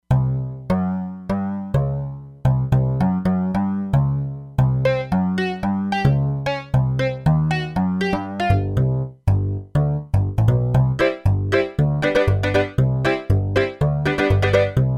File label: Bass, E-mu Proteus-3; Factory patches; Krafter Bass